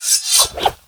Attack; Naga Corsair

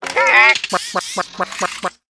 SFX Enter Play; Mekka1

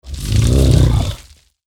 Mountain Giant Attack

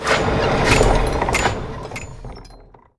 Supply Tower Attack

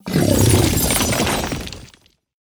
Living Light, Play

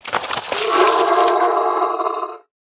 Painted Canvasaur Play

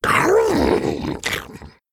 Male Murloc Trigger

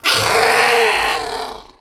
Pterrordax

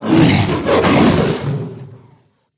Crystal Lion Play

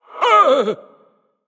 male ethereal, Death

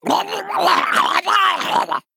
Male Murloc Play

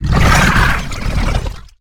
Obsidian Statue Play

